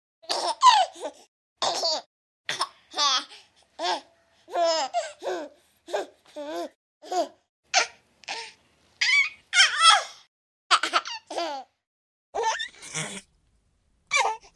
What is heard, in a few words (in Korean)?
아기 웃음 소리